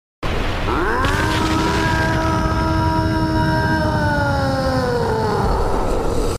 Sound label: Titan Roar Sound Effect; Attack Titan